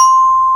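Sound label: glockenspiel C4; AKAI S6000 CD-ROM - Volume 1; Piano; MALLETS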